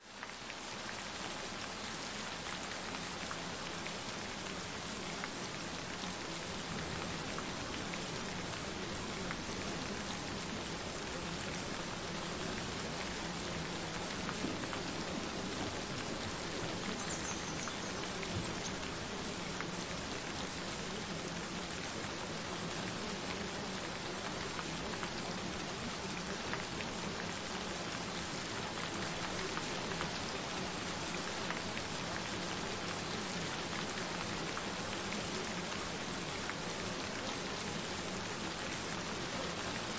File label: Gentle Rain Sounds